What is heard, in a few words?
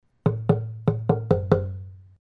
log4, black swamp log drum extra large; log drums